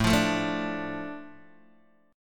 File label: A Suspended 4th Sharp 5th down Guitar Open D